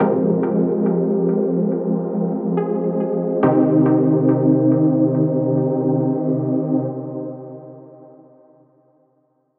Chords Full Ambient Downtempo; midi wizard 2; Unison

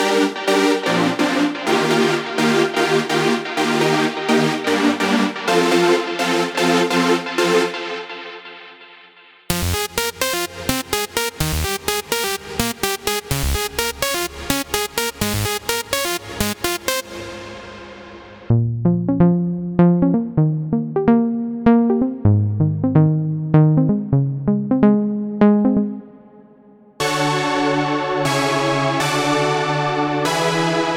Big Room Prog House

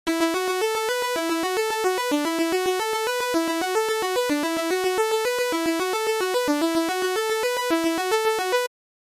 Melody Dreamy

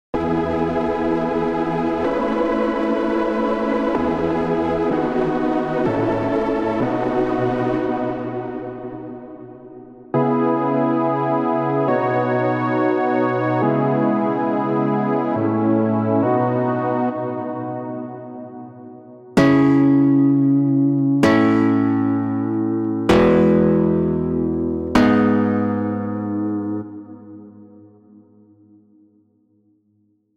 Chords Pop Future Pop

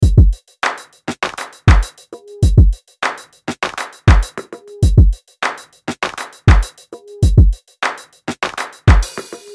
Ambient Downtempo 100BPM

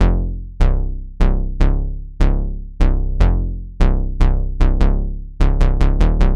Hip Hop Rap Bass

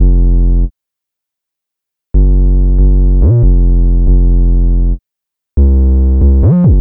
UK Drill UK Grime

